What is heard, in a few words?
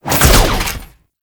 Dodge Land; Enemies; Abilities; Warframe; Tau; Dax Ninja